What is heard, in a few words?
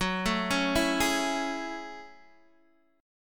Gb Minor 9th, Guitar DADGAD